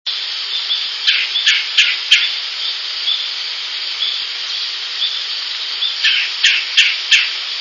woodpecker red-bellied; tree frogs in background, Cabin 3, Mohican Outdoor Center, Delaware Water Gap; birdsongs

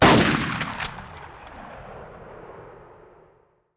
Splash

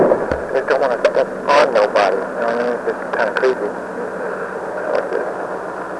ghost

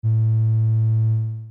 triangle, oscillator

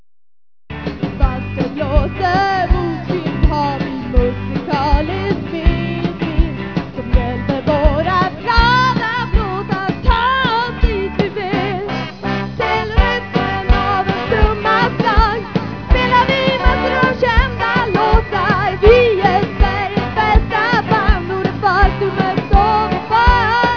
rhytm

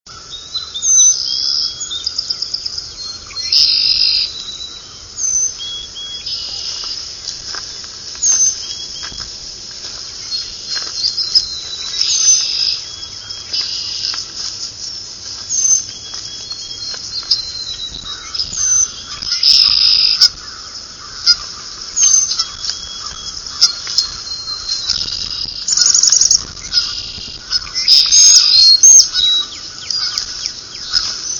chickadee song switching

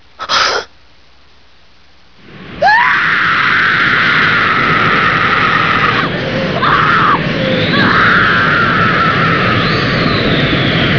scream

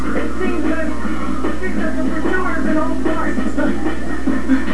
old fart

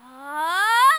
hhaaww; Street Fighter; Chun-Li

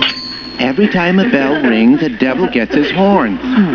horns

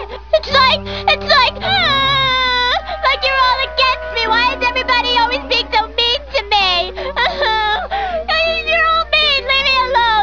cry

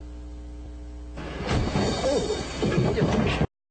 horse kick

